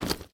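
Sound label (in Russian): выкладка туристического коврика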